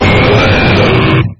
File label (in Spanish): Grito de Onix